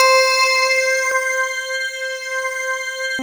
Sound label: World of Synthesizers, SYNBELL